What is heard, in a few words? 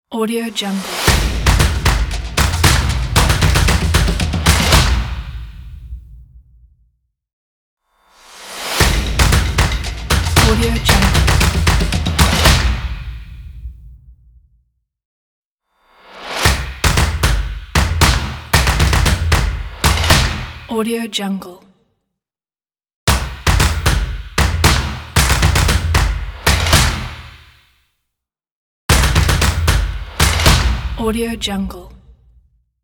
claps intro logo; boxbeats